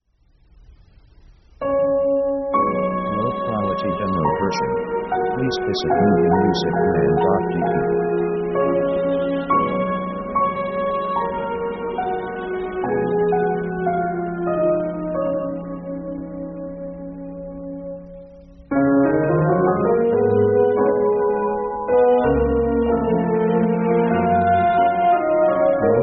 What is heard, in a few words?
MOONLIGHT DANCE, orchestra